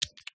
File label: BUTTONS SWITCHES; PMSFX